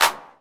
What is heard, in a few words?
clap sample a sharp key, Royality free clap sample tuned to the A# note. Loudest frequency: 2900Hz; single hits one shots